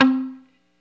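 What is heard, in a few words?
guitar mute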